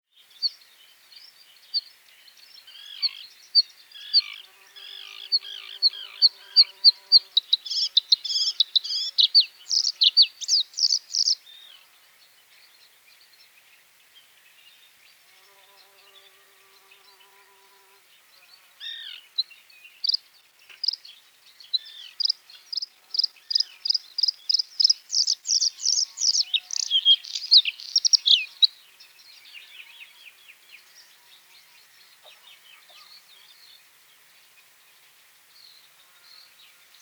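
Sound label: bluethroat voice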